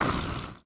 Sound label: Multimedia Internet CD-Rom Flash Wipe